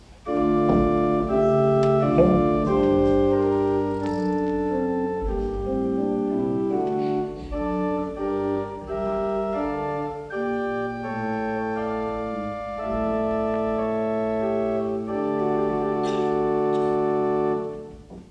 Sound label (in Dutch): prestant 8, orgel; assen